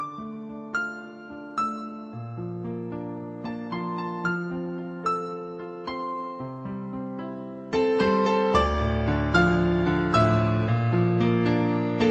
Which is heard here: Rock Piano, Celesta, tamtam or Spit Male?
Rock Piano